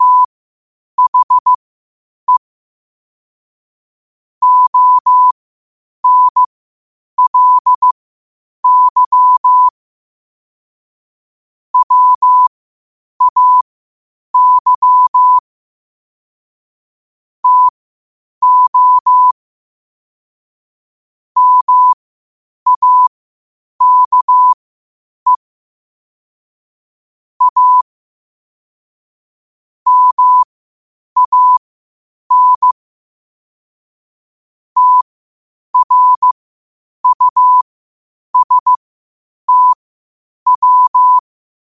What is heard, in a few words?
5 wpm, morse